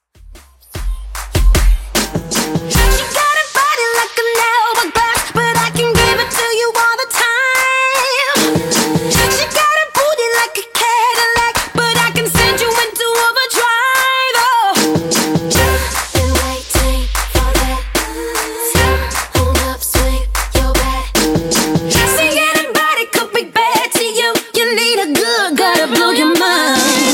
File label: Top40 Extend 150bpm